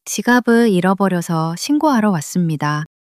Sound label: struggle